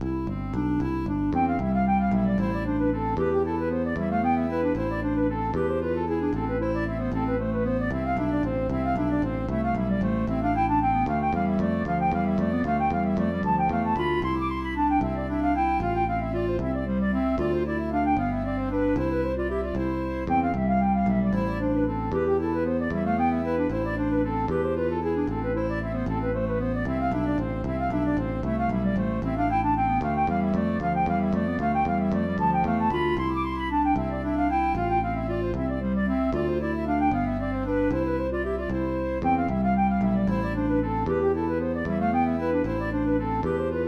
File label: Irish Lilt; MTWFC VOL1 Audio Accompaniments